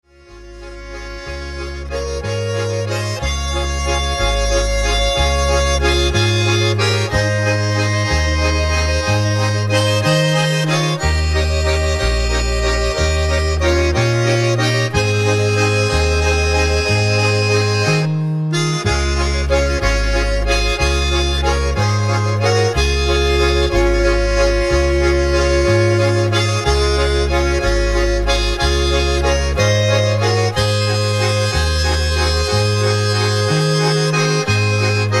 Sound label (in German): Stockhornliecht Walzer